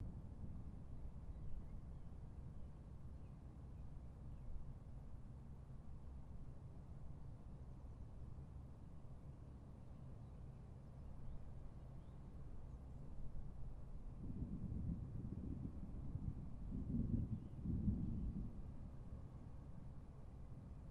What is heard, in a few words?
Violent Thunderstorm; Rowfant